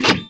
piston retract

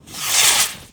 flare fire; shooter

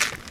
gravel footstep

